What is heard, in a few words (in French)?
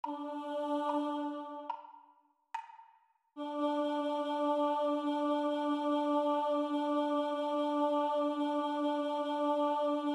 Chant